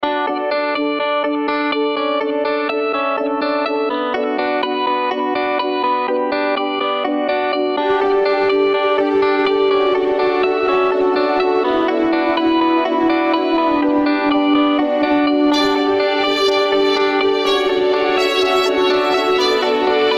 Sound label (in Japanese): ジングル 呼応、活況 BPM124